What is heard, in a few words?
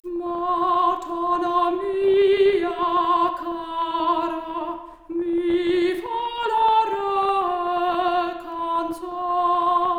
Soprano Stereo output noised; 3bit